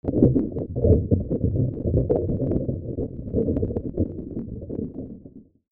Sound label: RAIN